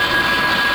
Rocket Missile Loop; SFX Kit; Weapons

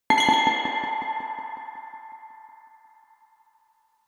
Reaktor Drop; Inquisitor 2

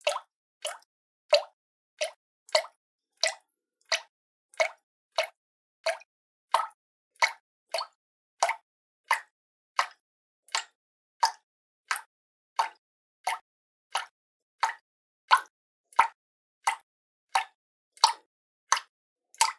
water drops; liquid; NS Studios - ultra sound collection

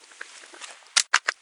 Cancel; Apotheorasis

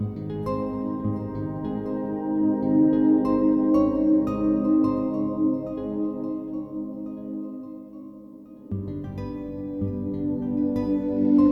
Fantasy Exploration; Shockwave Sound; Fantasy Worlds Vol II+III

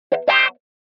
Guitar Thin Wah-Wah Chord - Very Short; Hollywood Edge; sound designer's toolkit v2

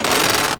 Stretch Metal Arm Extend; Foley - Rattles

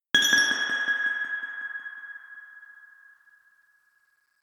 Reaktor Drop; Inquisitor 3

